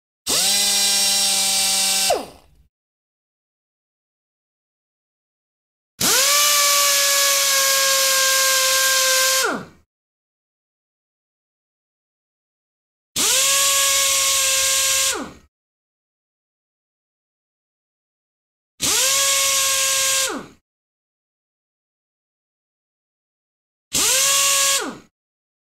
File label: Power Impact Wrench